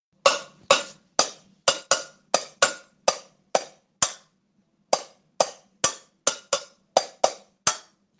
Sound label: small battery